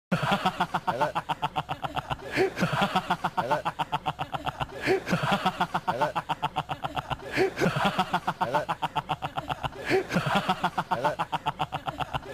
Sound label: Laugh